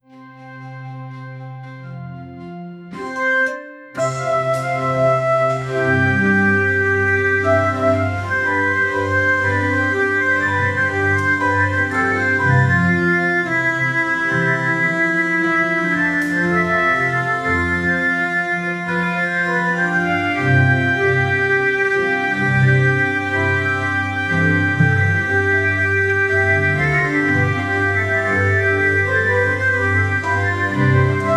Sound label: a peaceful islamic music, oud